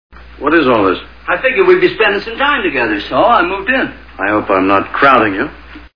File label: crowding